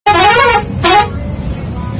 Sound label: Mini Bus Horn